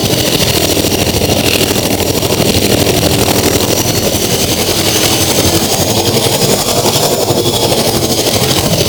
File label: Archwing movement engine loop; Warframe